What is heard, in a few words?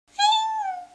dog